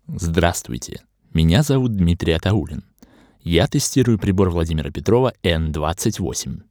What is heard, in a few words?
PreAmp